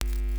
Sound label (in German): KNISTER; Xsample VOL-9; NOISE